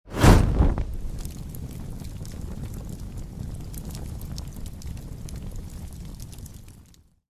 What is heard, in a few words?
Superhero flying through flames